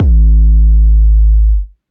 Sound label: Deep Kick; Percussive; Ableton; Factory Packs; Punch and Tilt